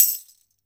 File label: DEFI CLOSE, Mediteranean Traditions percs